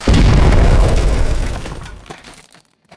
explosive hollow impact with rock debris; destruction

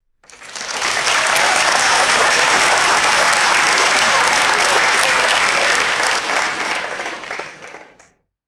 moderate crowd applauding indoors

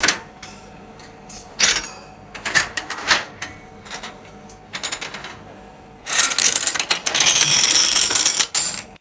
mechanical change machine operating with coins; game

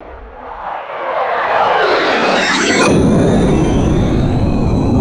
fast jet drone flyby with swoosh; aircraft

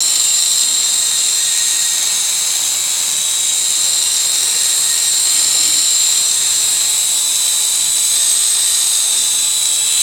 small rc helicopter hovering whir; toys

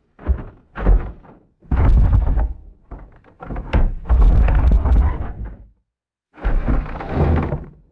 deep rumbling thumps and wooden creaks

